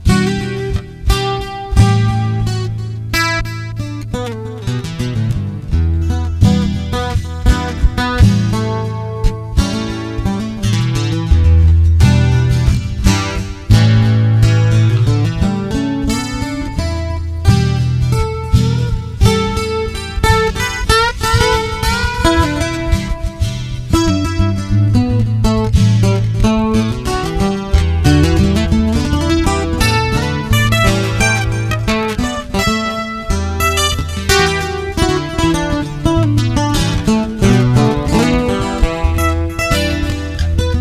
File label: smooth chill